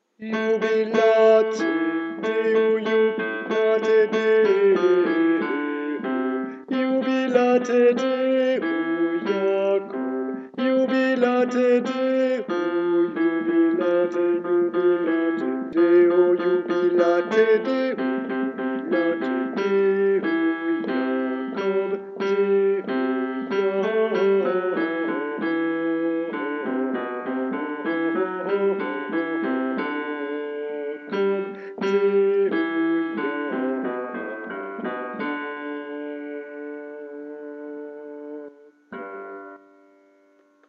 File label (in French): basse; jubilate